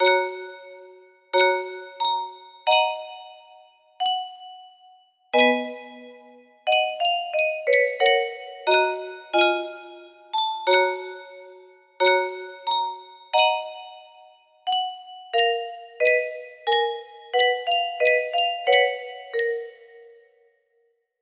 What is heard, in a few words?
g flat, melody; schubert impromptu